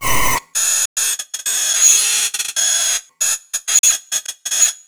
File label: loop, techno atmos